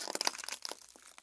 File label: glass; Pitch Black - A Detective's Demise